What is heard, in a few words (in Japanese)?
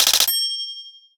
Regi; Neko Atsume